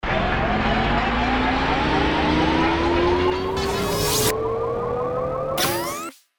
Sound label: Ascend Motor Rev